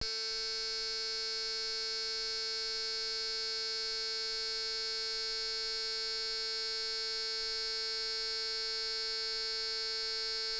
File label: lamdash blade3 sound